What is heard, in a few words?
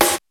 R8 DANCE KIT